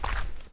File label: barefoot mud; Apotheorasis